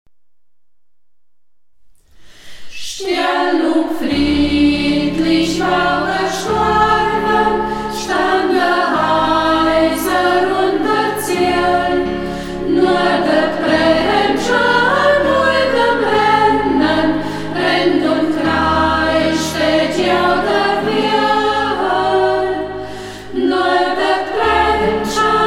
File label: chor, mundart; Ortsmundart: Burgberg; Burgberger Chor; Hegt wird gesangen